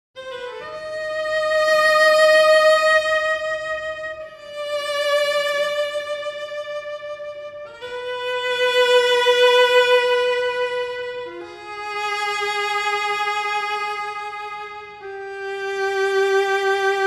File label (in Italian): Suite N°1 per violoncello solo